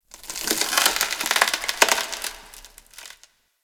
Infested Glass Crack; Warframe; Corpus Infested; Ambience

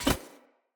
Unarmed Parry Impact

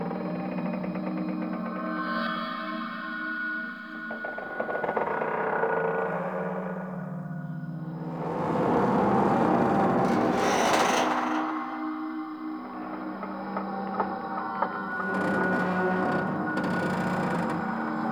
Exterior Metal Creak Small Loop; Ambience; Warframe; Duviri

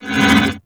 Void Portal Rotate One Off; Warframe; Ambience; Orokin; Oro Sabotage Objective

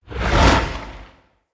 Spirit Proj Whizz By; Characters; Enemy; Mockeries; Abilities; SubBoss; Mockery

